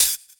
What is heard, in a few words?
Hhat, Hihat; Equipped Music; Loungin House